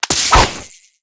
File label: grapple reach; phantom